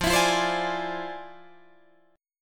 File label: F Minor Major 13th, Guitar, DADGAD